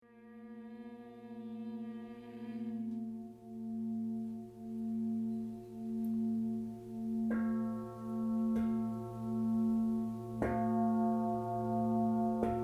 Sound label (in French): harmonie bol et voix